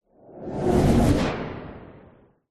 science fiction spaceship or rocket fly by ultra fast